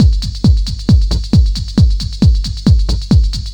Drums Techno

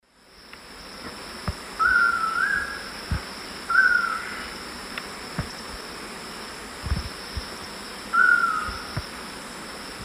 Lipaugus vociferans, call at lek; S. America; Eastern Lowlands

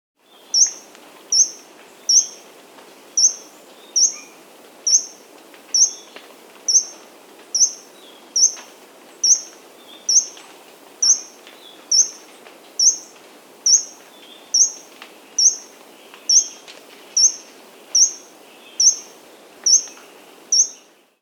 Bronzy Inca (Coeligena Coeligena); S. America

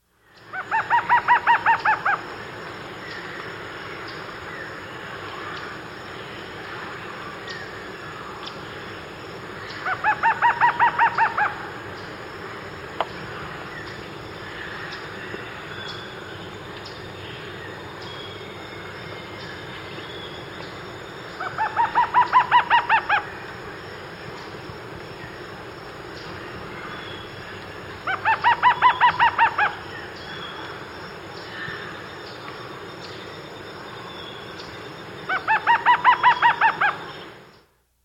Lattice-Tailed Trogon; S. America

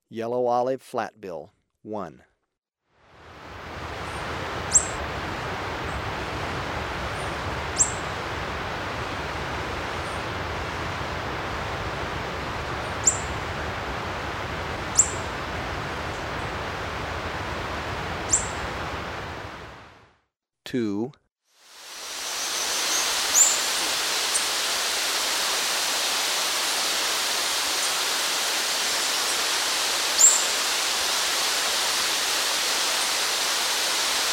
Yellow-olive Flatbill; S. America

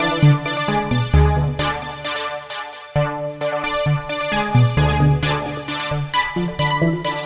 Lead Synth

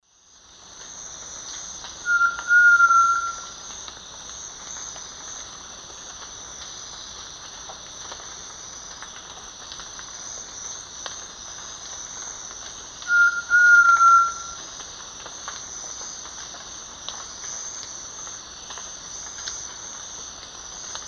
Grallaria dignissima, song; S. America; Eastern Lowlands

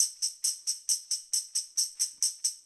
Knickle Tamb; Rock; Percussion

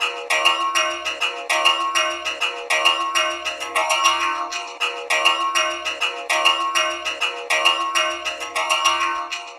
Scratchy Strings; Electronica

